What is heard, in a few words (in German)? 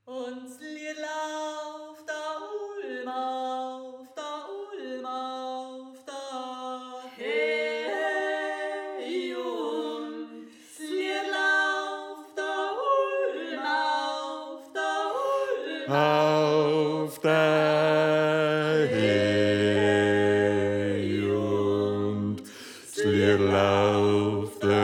Bass